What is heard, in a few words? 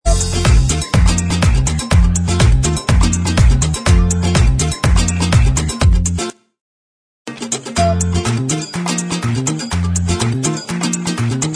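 instrumental, backing tracks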